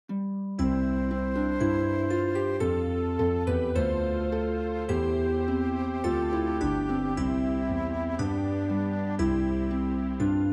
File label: instrumental